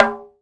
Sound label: tabla hardhit